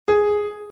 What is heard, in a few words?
g#2, piano